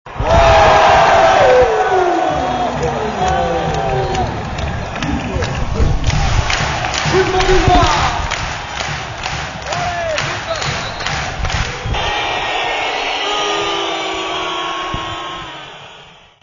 ambiance applaud sifflets

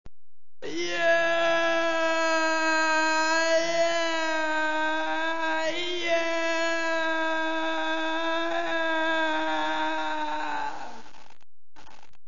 vocale cri curieux

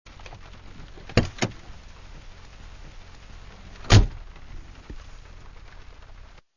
texture porte voiture ouvre ferme

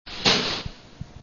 texture journal jete 2

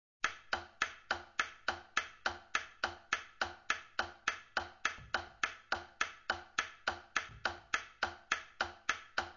cloc cloc

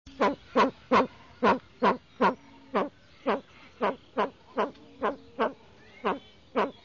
nature oiseau enrhume

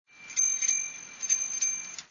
sonnerie sonnette

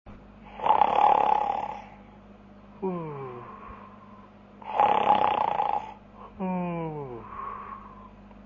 texture ronflements bip

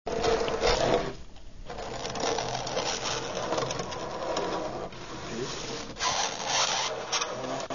texture grincement ongles

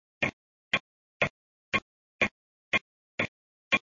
tic tac

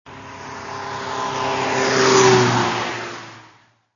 auto depassement

